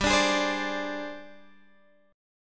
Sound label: Ab Major 7th Flat 5th G